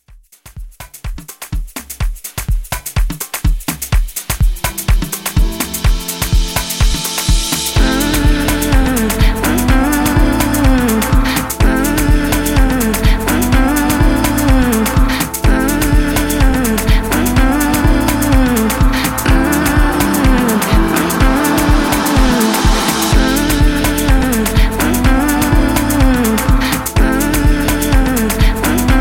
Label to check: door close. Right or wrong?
Wrong